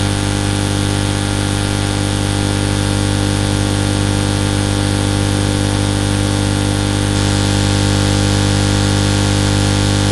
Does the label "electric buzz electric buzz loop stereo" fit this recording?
Yes